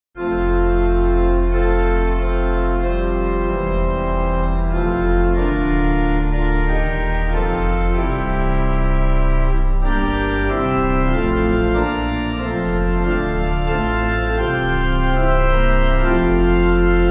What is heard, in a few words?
Pipe